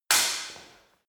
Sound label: sword hit sword single